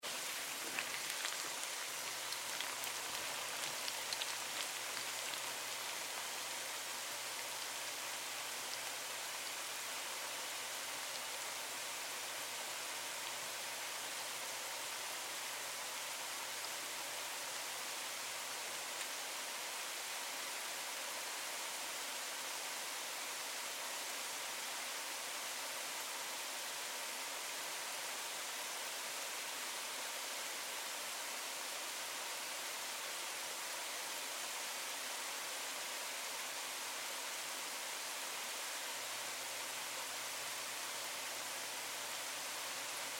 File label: Food Sizzle Oil French Fries Steady; Calm; Household; Big Room Sound